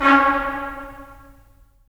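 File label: brass trumpets staccato, left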